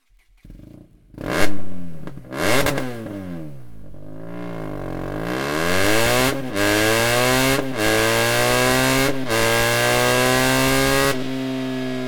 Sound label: slip on high with optional header; akrapovic